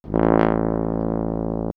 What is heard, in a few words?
Trombone, horn section; X-Static Goldmine; backtothe70's